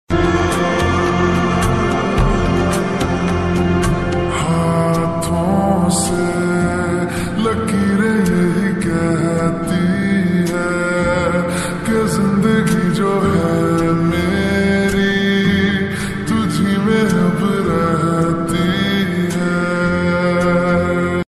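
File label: Slowed Reverb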